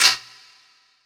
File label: Guiro; Essential Percussion